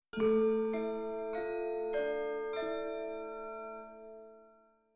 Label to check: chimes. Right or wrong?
Right